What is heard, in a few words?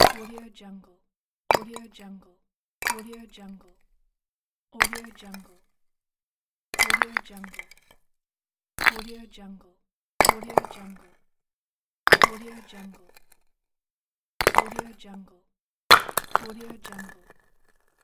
ice chunk impacts